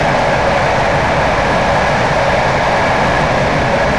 run, Class 47; OpenBVE; Train

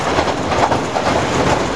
Run, Train; OpenBVE

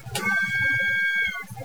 klaxon, Train; OpenBVE

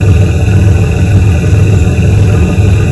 Motor, Train; H-Start 426 Siemens Desiro

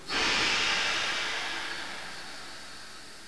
Air, Train; OpenBVE; Expreso CIWL Fleche DOr

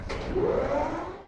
starter on, Train cl158; OpenBVE

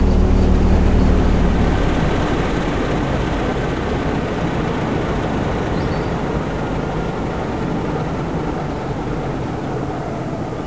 shutdown, CLASS 47, Train; OpenBVE

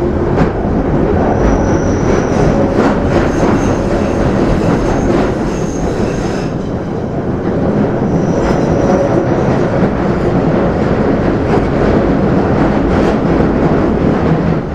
Flange, Train; OpenBVE

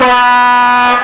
Klaxon; OpenBVE

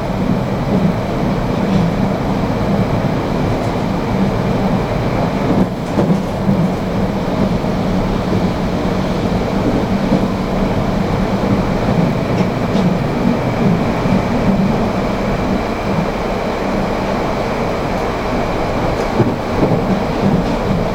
Run, Train; OpenBVE

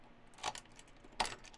Selector on, Train; OpenBVE

